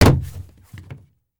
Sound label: Car Door Shut; Fairlight Sound Lib